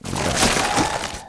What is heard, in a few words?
pull, zombie bomb